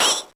red Pop; Heaven Studio Plus; toss Boys

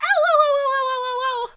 on fire; sm64coopdx; char-select-extra-chars; peach

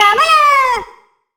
bomb; HeavenStudioPlus; fireworks

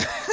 coughing; sm64coopdx; char-select-extra-chars; toadette

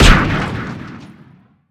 combo hit; HeavenStudioPlus; karateman

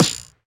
hit Baxter Close, air Rally; HeavenStudioPlus

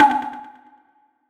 taiko explode; HeavenStudioPlus; fireworks

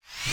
whoosh Forth Farthest; HeavenStudioPlus; Air Rally

